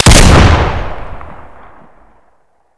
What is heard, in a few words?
Deagle; new weapons; deagle tiger; cstrike